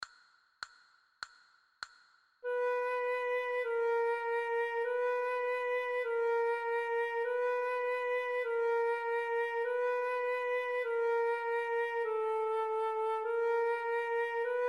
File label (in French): Si b, flute a bec